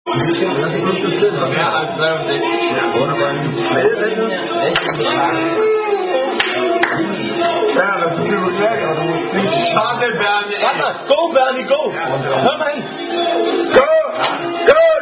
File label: Unknown German Dice Drinking Game